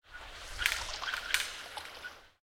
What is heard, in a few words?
Docked Ships; Blind Quest - The Frost Demon